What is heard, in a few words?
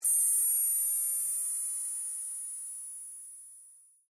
Laser Beam Cut High, Pitch; Laser Sound Effects; BlastWave